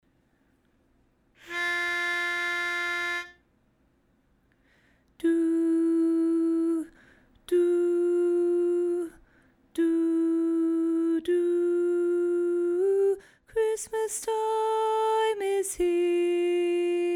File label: Lead Alone